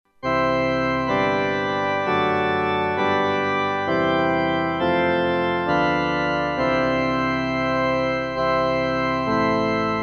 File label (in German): Vom Himmel hoch Keyboard